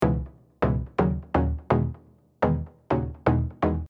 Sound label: Vibes Midi Deep House; deep house bundle